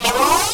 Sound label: Clan Dojo Door Open; Doors